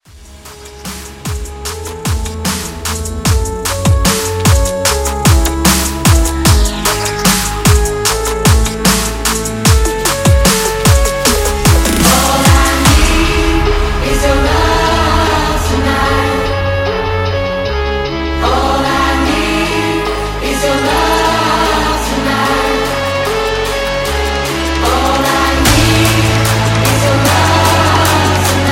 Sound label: Dubstep; Remixfreakz